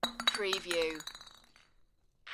Glass Bottle Drop Bounce

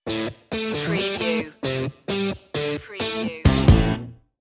fuzzy gritty skank stinger

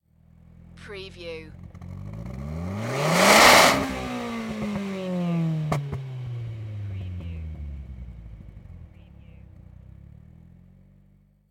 NISSAN SILVIA EXHAUST SINGLE REV

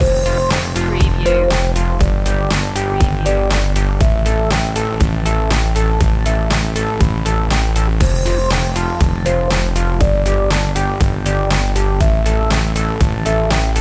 happy uplifting loop

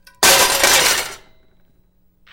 Metal Debris Impact Settle

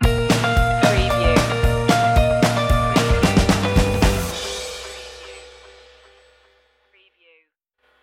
stinger

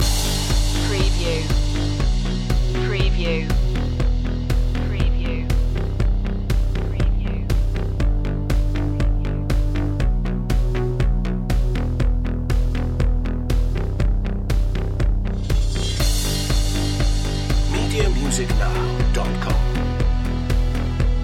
uplifting light loop

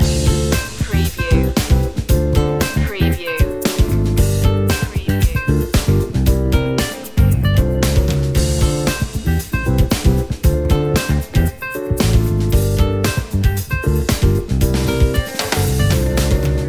the funky two loop

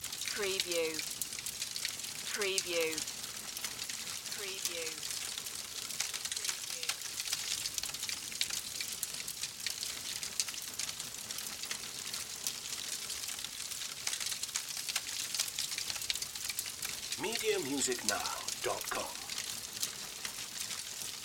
Fireplace Crackle

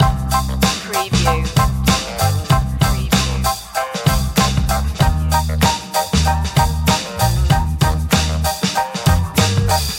spicy beat loop

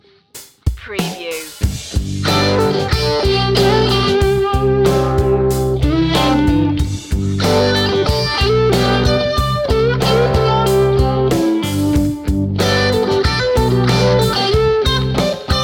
flower rock

